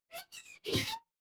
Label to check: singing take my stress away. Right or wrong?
Wrong